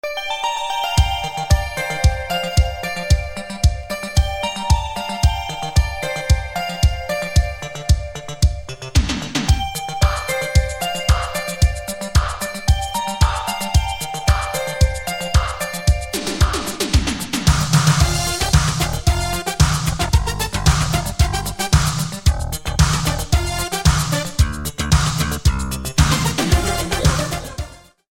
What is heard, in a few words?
Italo Disco